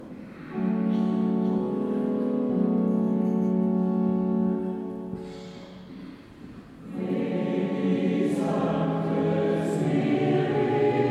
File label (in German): Zwischengesang, chor; Pfingstsonntag 2024